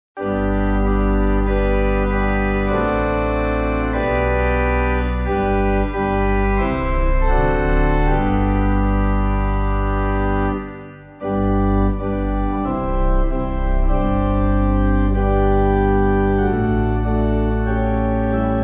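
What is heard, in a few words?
Pipe, hymn backing; Jesus High In Glory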